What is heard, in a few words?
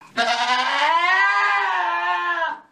adult goat baa scream